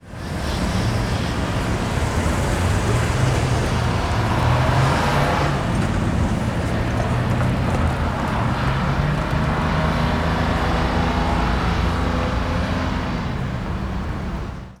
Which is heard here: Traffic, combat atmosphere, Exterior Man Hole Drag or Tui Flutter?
Traffic